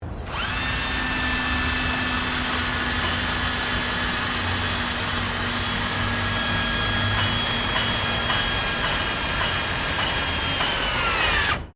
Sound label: POWER TOOL CORDLESS DRILL SCREWDRIVER; Various power tools